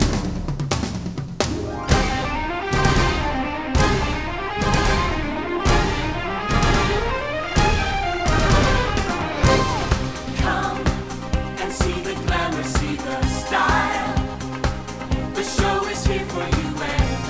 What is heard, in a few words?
Dramatic song